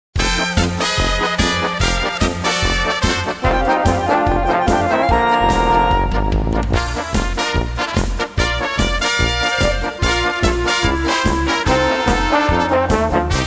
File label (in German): Beschwingte Trompeten